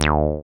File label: SYN 303, Roland TB-303